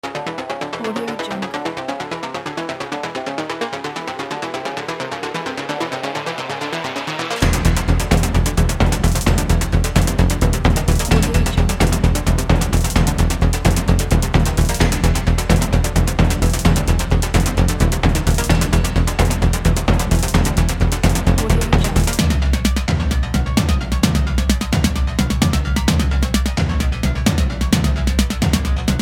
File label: modern scifi action trailer